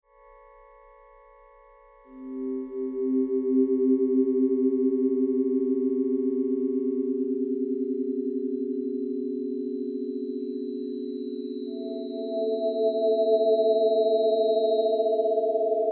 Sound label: kawai k5